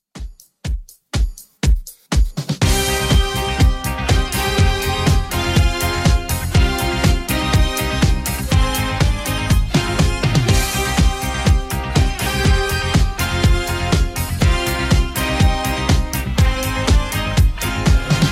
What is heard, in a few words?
70s Redrum 122bpm